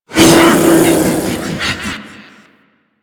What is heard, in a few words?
Ape Prelate Attack C; Enemies; Warframe; Proto Infested